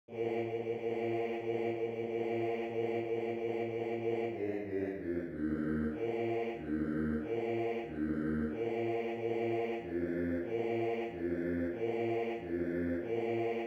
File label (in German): Bass; Leit bleibts da